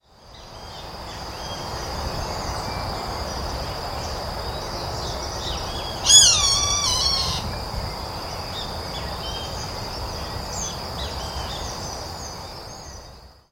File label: Pardirallus nigricans alarm call; JD Bot